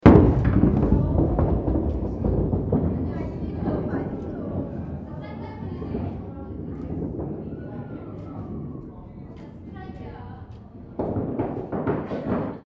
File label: bowling alley 18th century bowling